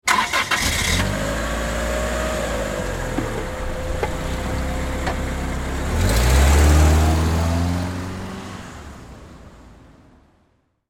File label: Auto Start Drive Off Dodge Stratus 2000 Automobile Car; Vehicle; Cars